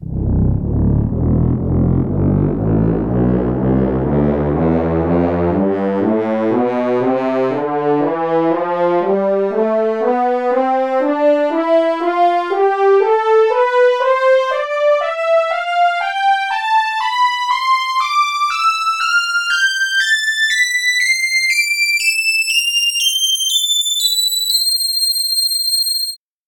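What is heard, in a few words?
french horns scale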